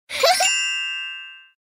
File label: sound win princese; redvsblack